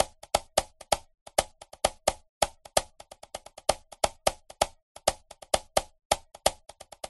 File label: congo drummer